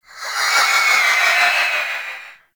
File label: Sticky Ink Vocal Call; Gameplay; Mutator; Warframe; Shadowgrapher